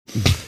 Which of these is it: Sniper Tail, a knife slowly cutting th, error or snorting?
snorting